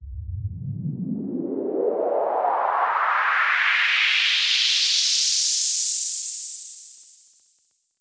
riser

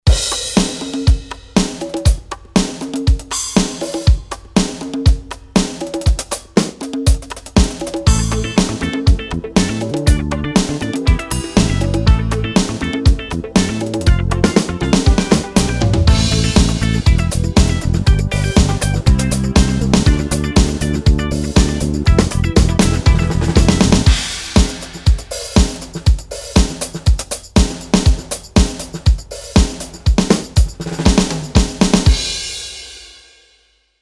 funk yamaha

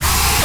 alien stabs c; savage sounds

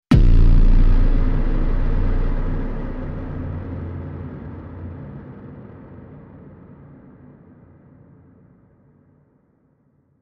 cinematic sound effect

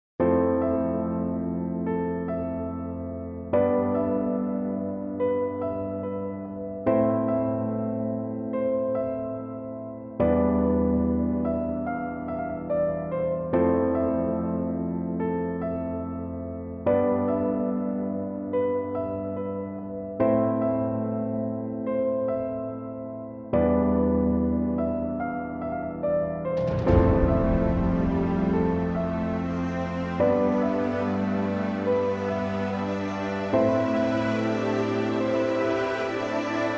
sad melody

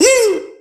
vocal words woo

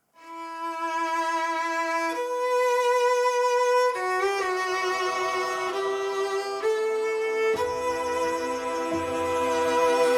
violin fullmix

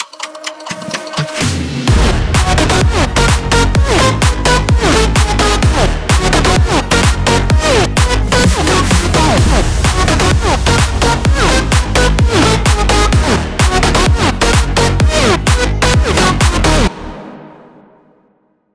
future bounce

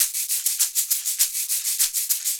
shaker loop

